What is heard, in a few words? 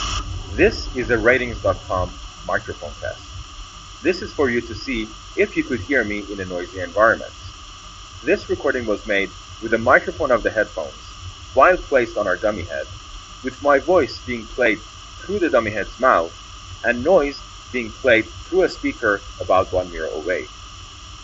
noise